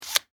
Cell Flip Phone, Technology, Cell, Flip, Phone, Antenna, Up, Cellular, Motorola